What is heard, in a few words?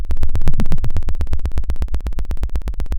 Sound of a fire crackling